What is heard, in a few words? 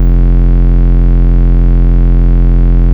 Root F, Bass; Vengeance Samples; Vengeance Ultimate Bass